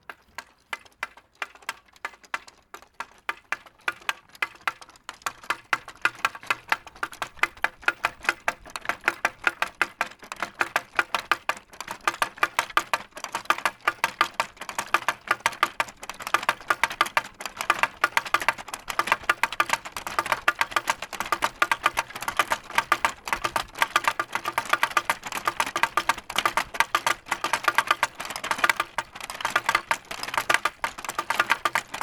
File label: Klopotec